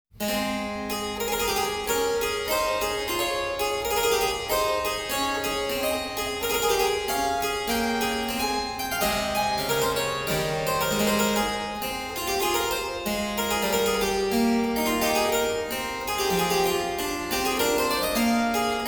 Partita in B flat major, Praeludium, Keyboard Works; Partita No.1; BWV 825; Johann Sebastian Bach; Partitas